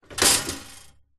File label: household toaster set push down slight room tone; zapsplat